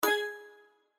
REEL STOP; Jamaican Jackpot

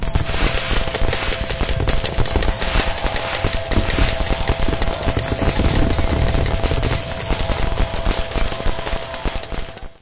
Linked Jackpot combined; slots; Robot Vs Monster

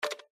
REEL STOP, SLOTS; Magic Coin

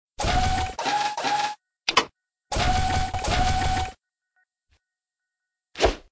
SFX Claw Arm; slots; Steam Miners

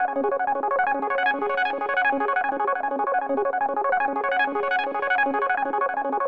Intro Spin Loop; slots; Wealth Gods2

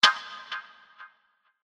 Lose; Kungfu Showdown

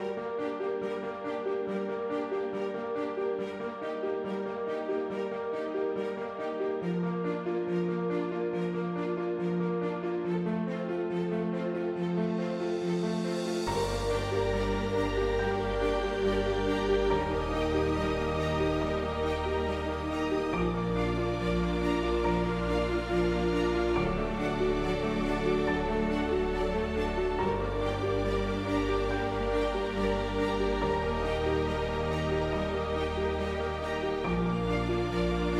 BGM Normal; slots; Valentine Blast

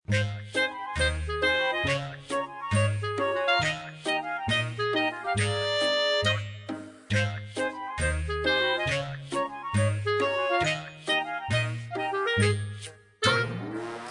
Bonus Game music; Sugar Town

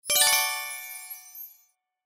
SFX Symbol Win; slots; Steam Miners

